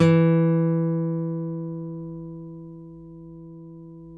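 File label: nylon, FLAMENCO, Guitars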